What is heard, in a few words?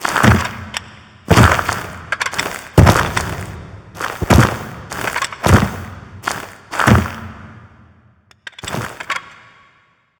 Fight Intro Foley; Cinematics; Ballas